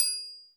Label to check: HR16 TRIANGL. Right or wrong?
Right